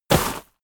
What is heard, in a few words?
step walk gravel